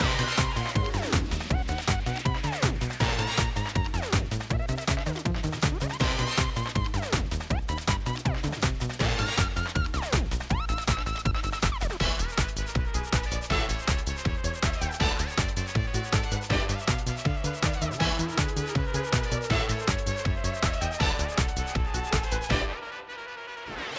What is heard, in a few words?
Space Party Loop